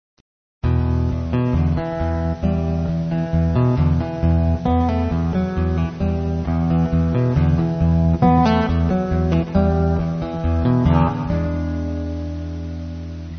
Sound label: acoustic blues, guitars